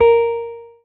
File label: marimba guitar; ゆめ2っき